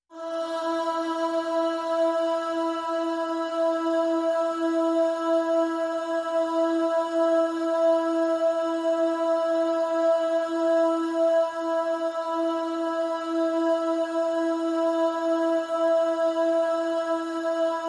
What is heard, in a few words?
Pop Vocal E